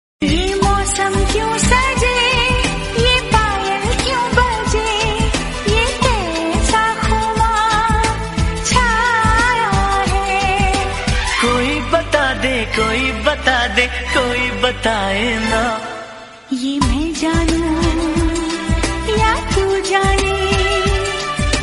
hindi, sad; chori chori chupke chupke

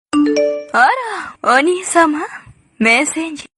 massage notification; ara oni sama

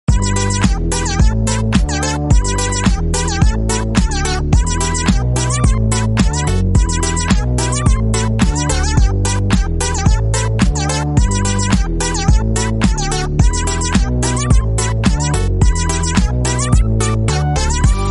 instrumental music

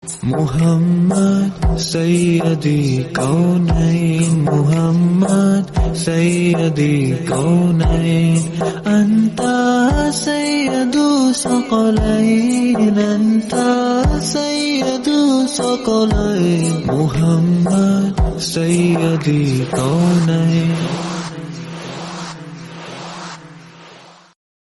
emotional islamic

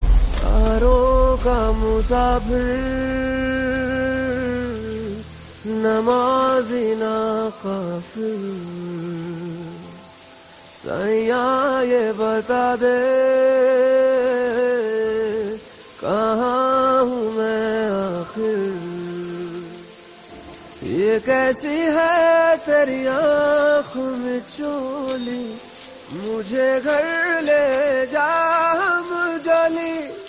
hindi song

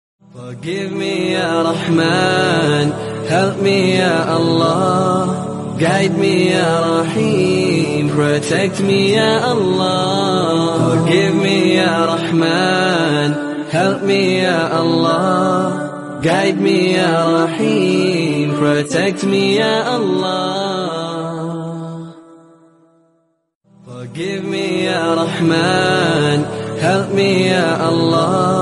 islamic devotional

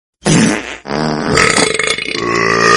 fart and burp notification

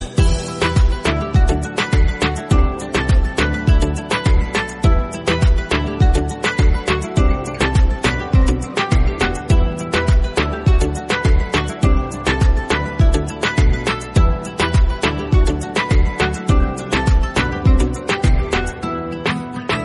background music, marathi; jhimma

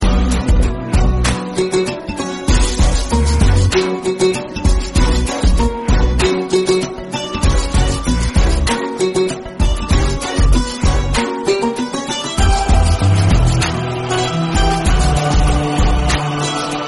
sad instrumental

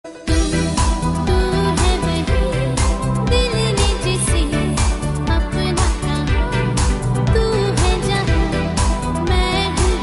dj hindi song

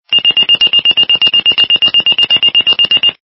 minitor 5 standard

